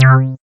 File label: DD SYN, Disco House